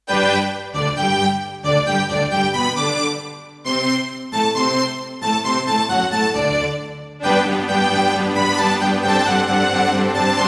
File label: Roland SC-88 Pro 88 MAP; classical; Mozart; Eine Kleine Nachtmusik partial